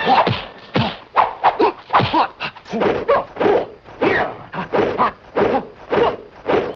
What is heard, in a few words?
martial arts